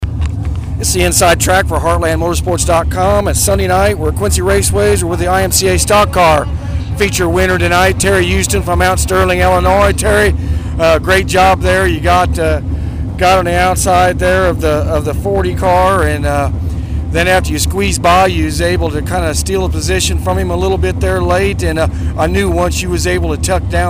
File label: Stock Car; Quincy Raceway; IMCA; Mt Sterling IL